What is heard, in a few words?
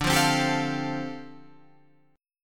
D Minor 11th D down Guitar Open F; Dm11 strummed